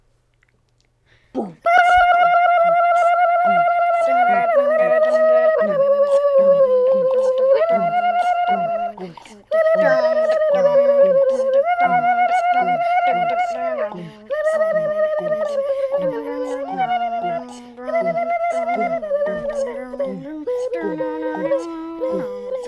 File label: zoo